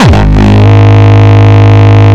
Longkick, kicks

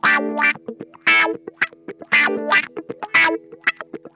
THROATWAH, Funk Guitar; Zero G - Funk Guitar

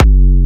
Jump Kicks, Bassdrums

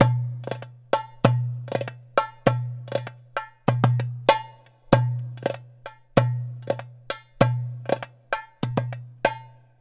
Clay Dumbek, Ethnic Percussion; Sonic Foundry (Sony Creative Software); Ambient Atmospheres & Rhythms

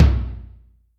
TOM, Acoustic Tom; Yamaha DTXpress

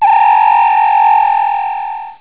whistle; jazz jackrabbit 2; M8

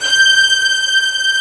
VIOLIN G5; STRINGS #2

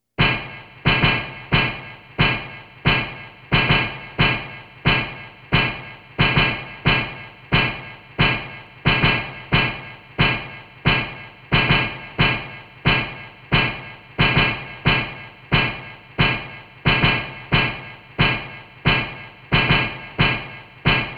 Amb Perc; Beat Components

